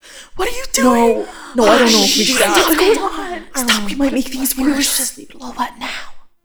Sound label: Destroyed Class Student Ruckus; Zariman